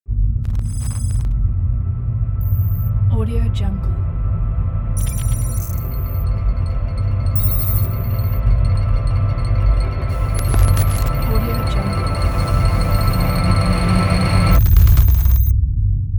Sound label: atmospheric logo